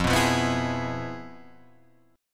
F Minor Major 7th Flat 5th

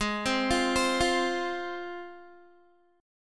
G sharp 6th G sharp down Guitar, chord; G#6; arpeggiated

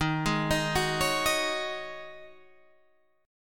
Db Suspended 2nd Suspended 4th Eb

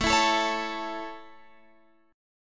A Major A, Guitar Open F; strummed

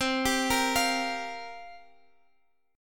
C 7th Suspended 4th, Guitar Half Step Down, chord

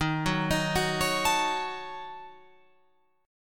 Eb Minor 7th Flat 5th, Guitar Open F; Ebm7b5 arpeggiated

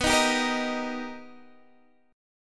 B Augmented Major 7th B down Guitar Standard; B+M7 strummed; chord shape; JGuitar

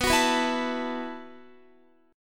B 7th Suspended 4th B down Guitar

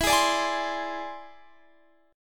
E Augmented 7th E down Guitar Standard; E+7 strummed; JGuitar

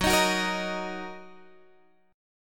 Fsharp 6th down Guitar Open G

